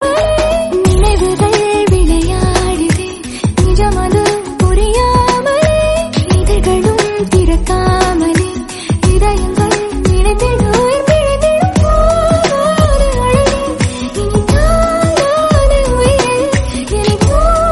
female version song

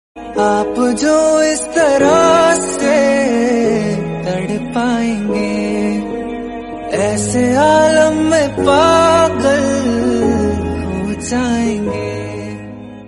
hindi song tone

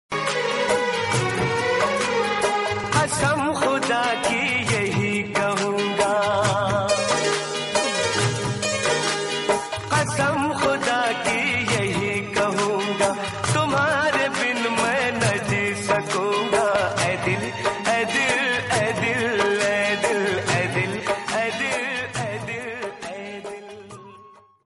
sad hindi song

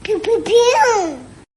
pee pee pew

